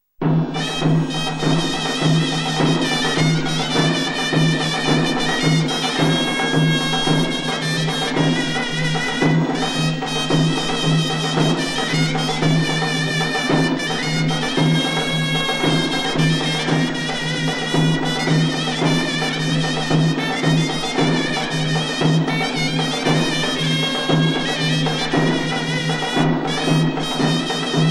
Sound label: Zournas & Ntaoul